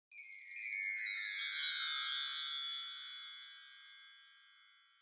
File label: Wind Chimes Down Low